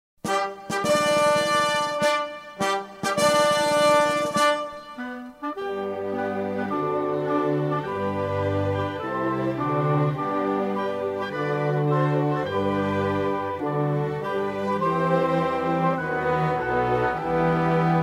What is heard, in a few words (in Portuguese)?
Hino Da Proclamação Da República Instrumental